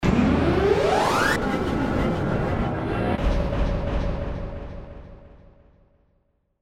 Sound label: Ascend Swirl